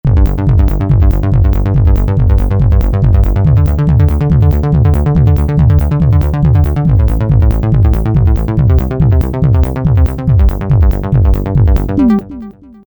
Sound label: Auto Disco, Korg MS2000; Factory Patches